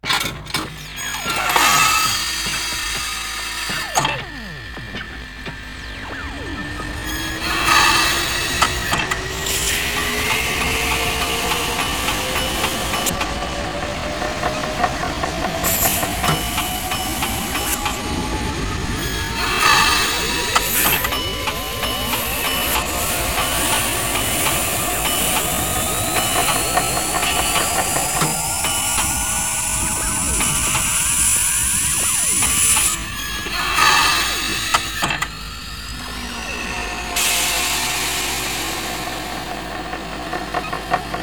mech inflate

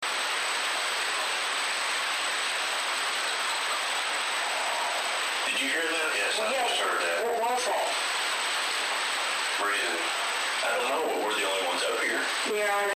breath

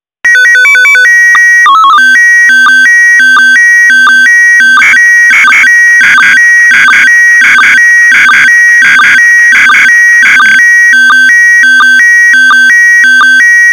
SSTV OVER FM

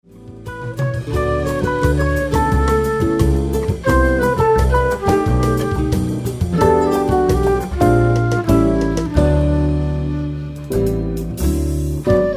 Samba INSTRUMENTAL

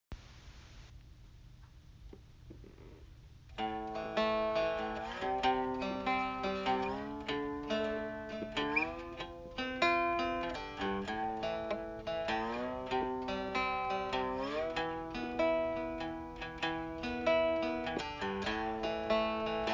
3 String Fretless

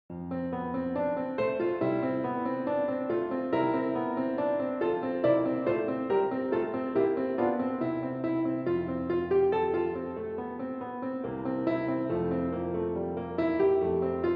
Accompaniment Track; Abendlied

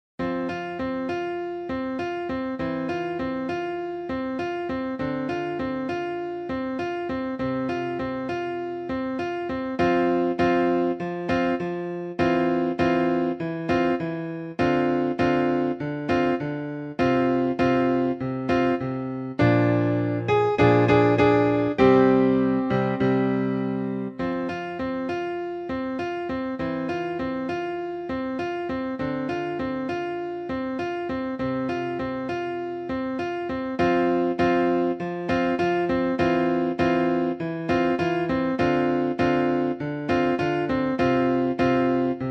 Groove

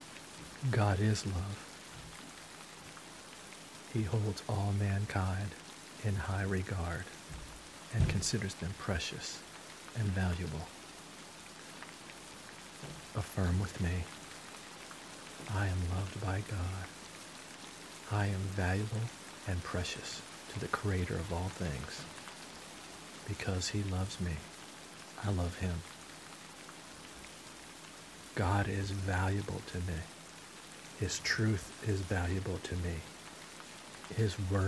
Lite Rain

